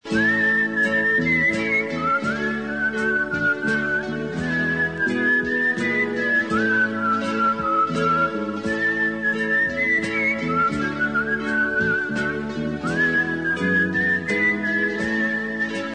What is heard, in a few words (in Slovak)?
pesničky